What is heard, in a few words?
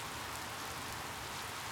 rain; Ambient; MX vs ATV Unleashed; XBOX